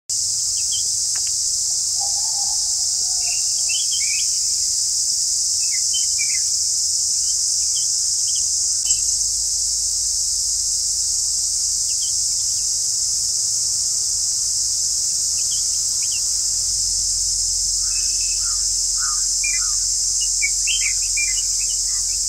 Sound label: Deep Western Ghats Forest